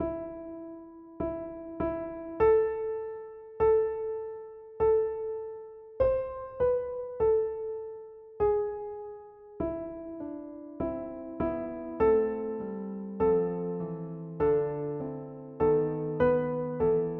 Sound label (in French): Soprane, Chaque voix jouée au piano; 720 Ave Maria